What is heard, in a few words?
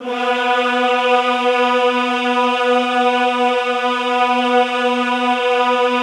EHH B2, Classical Choir; Best Service; Extended Classical Choir; EHH MIX